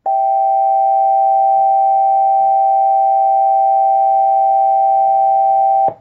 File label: Tone